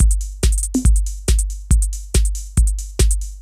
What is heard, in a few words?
techno 140